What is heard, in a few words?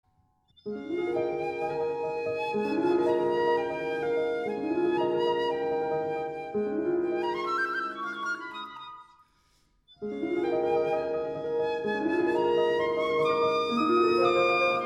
lento, piccolo; J.Novak; Marsyas